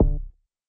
Button close

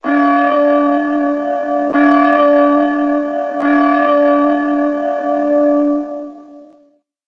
Funeral bells

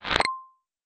Dna pop

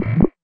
Infect pop

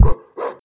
move bubble

